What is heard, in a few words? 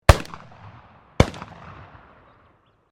MUSKETS FLINTLOCK UNKNOWN FIRING DOUBLE, at a shooting range; Black Powder firearms; Gun Shots